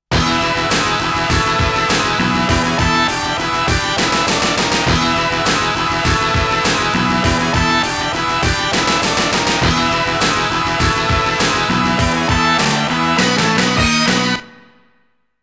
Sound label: Ending Serious Version; Aero Fighters; Sonic Wings